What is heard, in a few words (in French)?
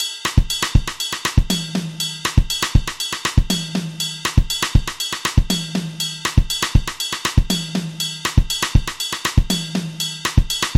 songo batterie